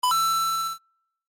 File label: coin